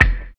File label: LO FI BD, Drums Dance & Groove; LO-FI KIT; USB Soundscan vol.11; AKAI